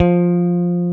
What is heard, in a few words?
Basses, Roland